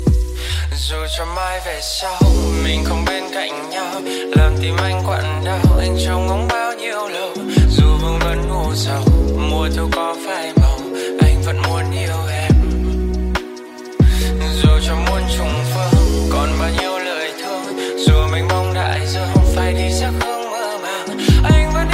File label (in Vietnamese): Lofi; nhac chuong